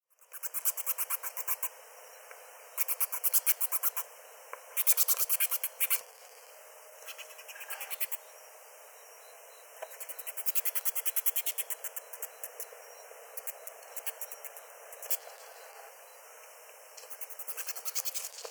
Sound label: kiiro sashigame